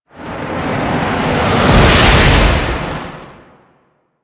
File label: Void Comet Whiz By; Warframe; Void Storm; Railjack